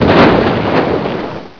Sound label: bang